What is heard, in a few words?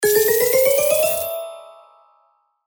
avatar counter